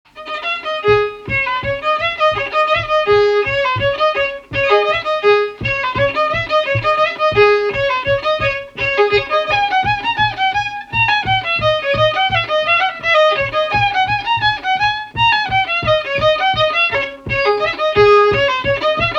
Mazurka; Mazurka de Mouret

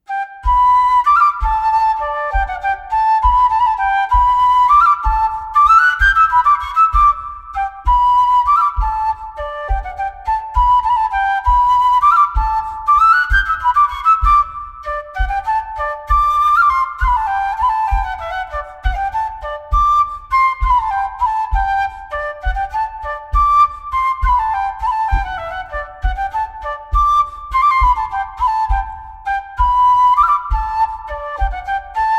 Montagnarde en majeur; Souvenir du Mont D Or; Brod

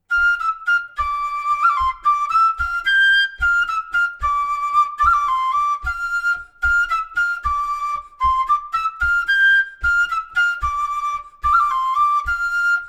Chants du Velay Bourree

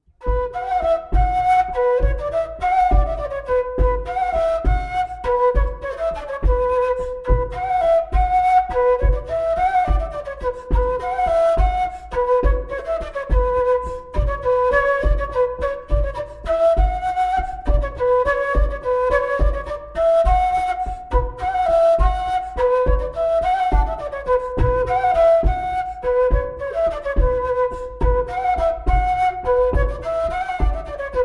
Bourree parisienne montagnarde

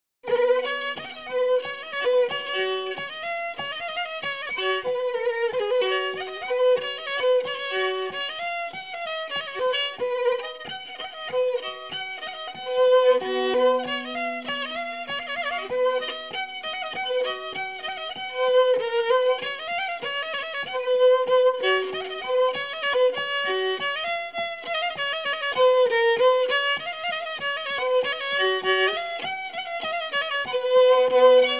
Marche À La Cabrette

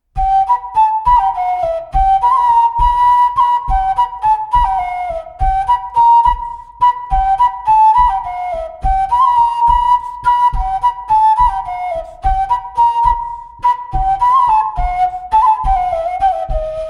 Montagnarde; Recueil De Raoulx

